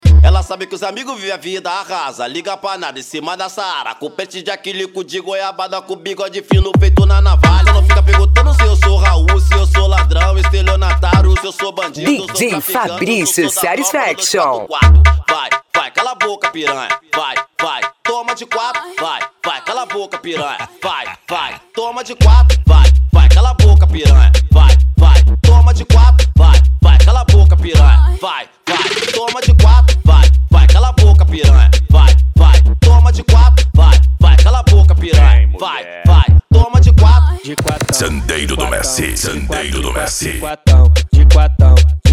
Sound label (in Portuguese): funk mega bass